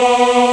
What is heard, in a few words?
Choir Aahs; cubic